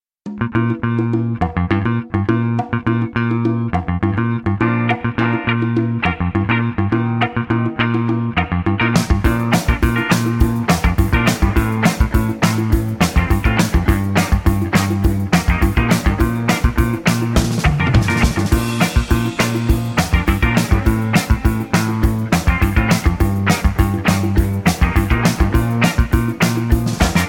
Bb No Backing Vocals, backing tracks

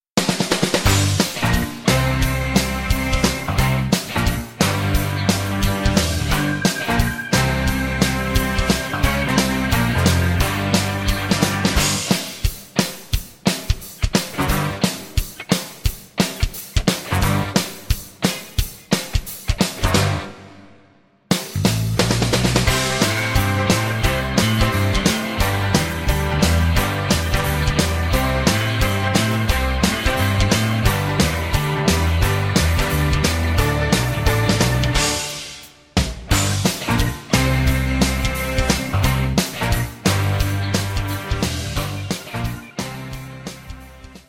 (G) No Backing Vocals

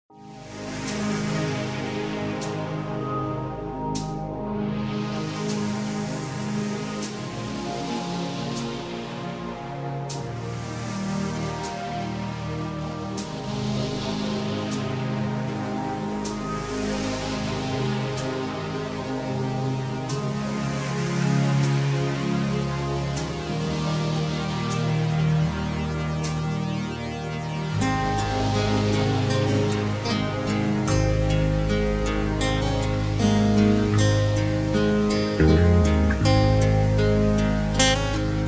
Ab No Backing Vocals, backing tracks

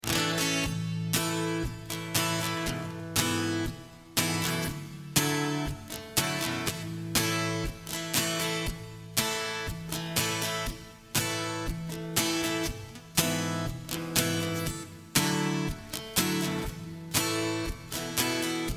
I Did (A) No Backing Vocals

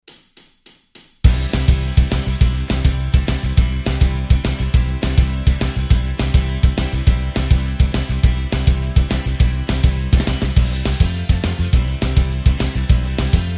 (C) No Lead Guitar

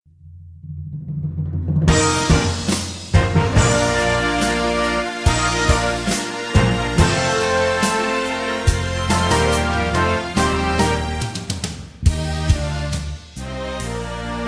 No Backing Vocals

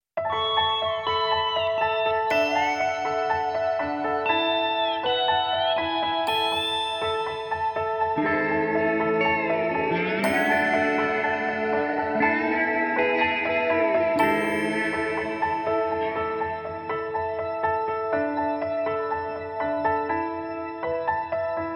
Elijah's Song (A) No Backing Vocals

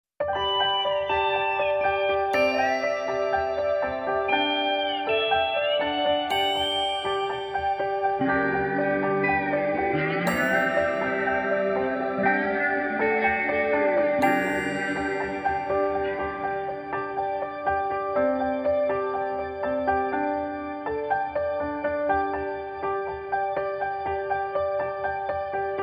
Elijah's Song (G) No Backing Vocals

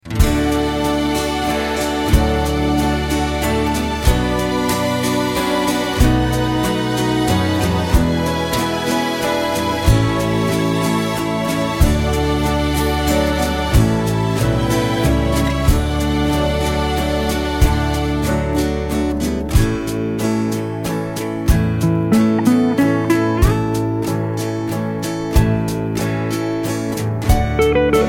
(D) No Backing Vocals

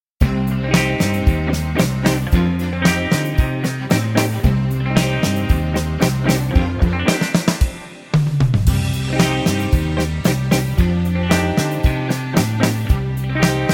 No Backing Vocals; Bb

